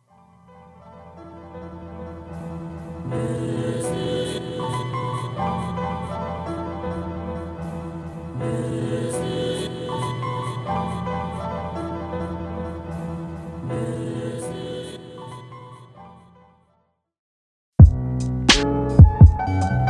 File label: healing